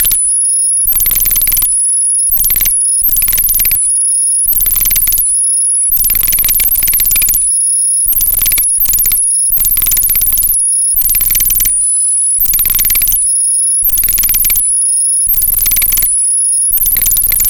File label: glitched tones Machine Gone Wild